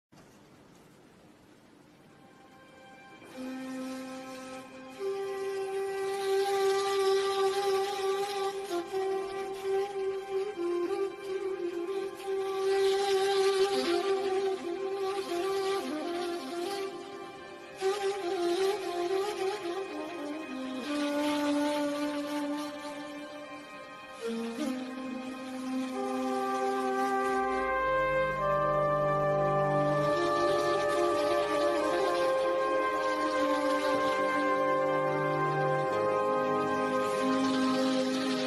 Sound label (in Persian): ساز نی